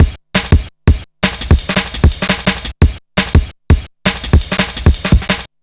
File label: acrobat, breakcore